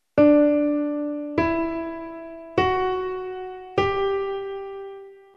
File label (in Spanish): escuchar re mi fa sol graves